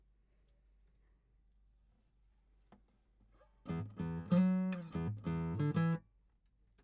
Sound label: GTR